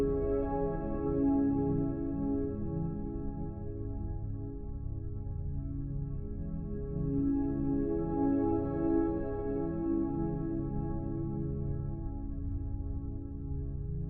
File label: Music Pad, Player Instrument; Arbinn Hills